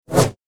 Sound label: Double Bladed Saber Sound; FXHome